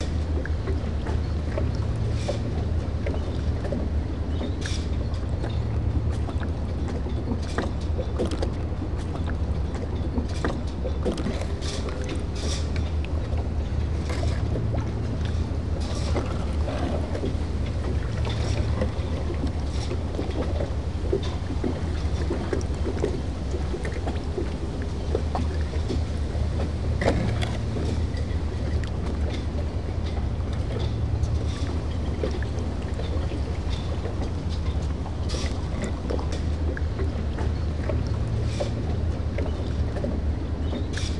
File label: water ambience; gauntlet seven sorrows